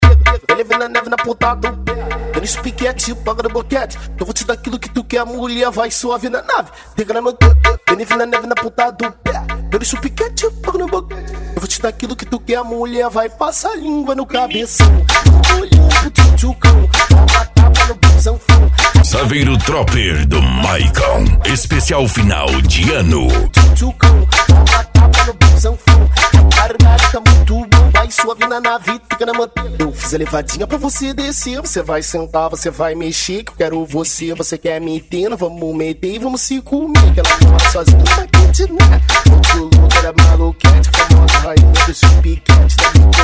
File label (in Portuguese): funk agressivo funk